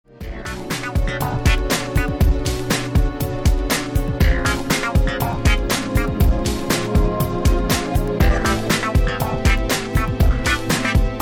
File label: funky mcnasty